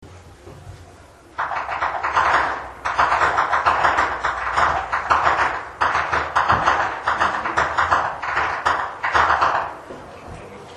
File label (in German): schnalzen, flachgau; muntigl